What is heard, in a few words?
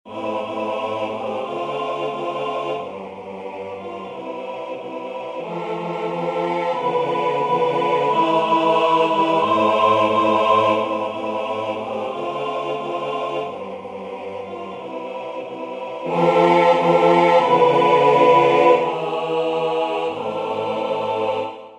tutti; Nada te turbe